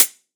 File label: Hi Hat